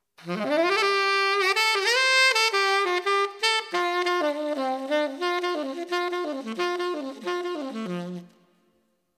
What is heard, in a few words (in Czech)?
tenor saxofon